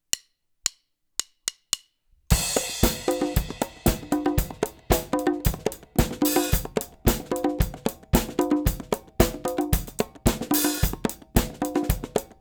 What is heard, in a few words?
Disco 112bpm, Percussion